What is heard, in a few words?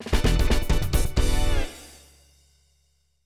New Challenger; super street fighter ii turbo; 3DO, FM Towns